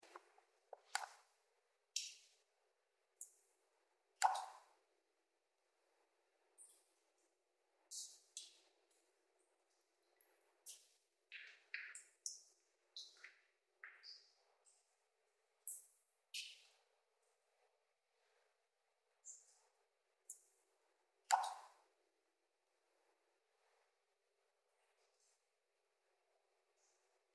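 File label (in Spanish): Esclusa Embalse, dam sluice; Gallego; Galeria; La Pena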